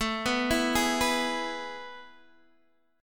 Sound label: A Major 7th Suspended 2nd, Guitar, Open D; chord